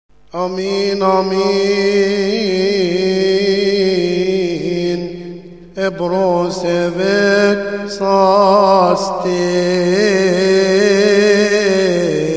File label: Amen; Amen Amen Epros Eveksasti; Hymns; St Basil Liturgy